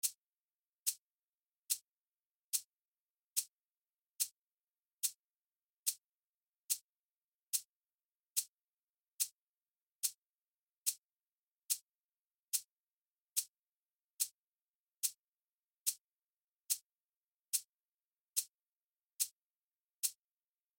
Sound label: open tenor; BCC choir